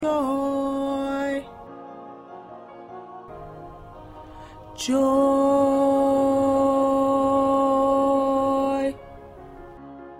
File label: Backup Tenor